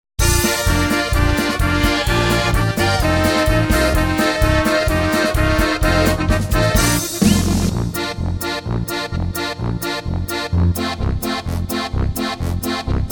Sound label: Oberkrainer; wersi